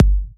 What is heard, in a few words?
Soft Kicks; Vengeance Samples